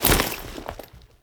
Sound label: Foley Step; Warframe; Characters; Kahl